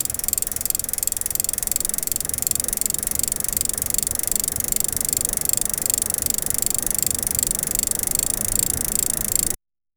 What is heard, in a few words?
loose nuts and bolts, bike